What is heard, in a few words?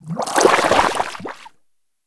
player break surface; Modern Warfare